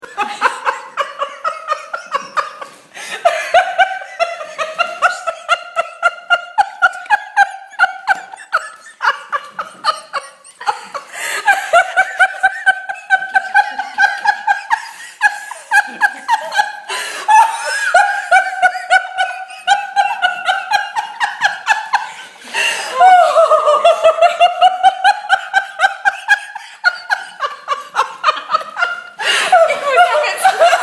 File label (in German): LACHEN